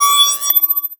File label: Multimedia Internet CD-Rom Flash High Pitch